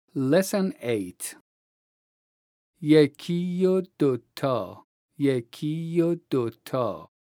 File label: vocalization, Daf